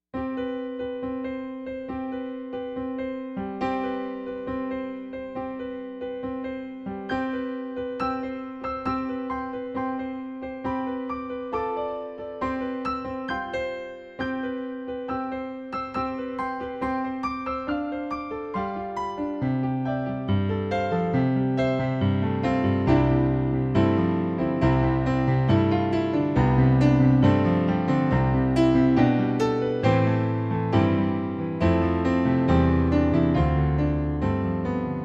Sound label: piano